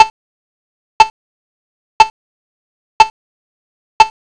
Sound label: Locator tone; Pushbutton locator tone